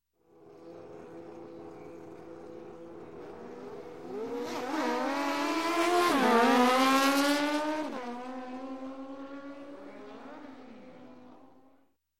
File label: Motorcycle, Race, Drag 2 Bikes Rev Up & Race By; Sound Ideas; Series 2000